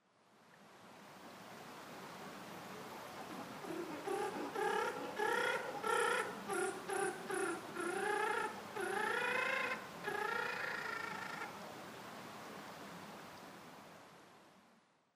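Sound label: Japanese giant flying squirrel; musasabi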